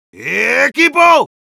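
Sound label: jeers, Spanish; Medic; "¡Eeeequipo!"; Likely a reference to Colonel Klink's "Schuuuulllllttttz!" from Hogan's Heroes.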